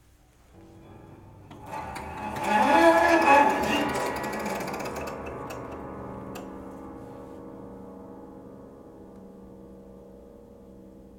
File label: Swell Sting